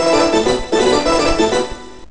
tennis; ST-XX Amiga Soundtracker Sample Packs

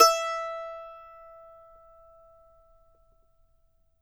MANDO NOTES, E OPN; Sampleheads; Whole Lotta Country 1 & 2

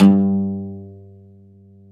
UD G 2; SoundBytes; Early Patches

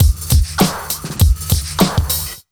100 Beat, Underground Hip Hop; USB Soundscan vol.02; AKAI

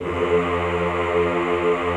EHH F1, Classical Choir; Best Service; Extended Classical Choir; EHH MIX

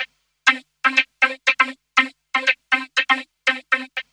VOC LOOP, VOCODER LOOP; Zero-G Ambient 1

